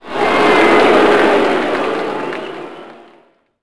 boo, crowd; Splashdown; XBOX

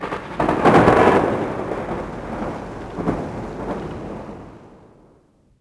Thunder

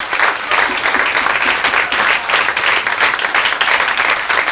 cheer; WhiteOut; XBOX; bokujo

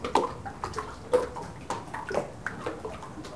lap; Splashdown; XBOX; Soultree

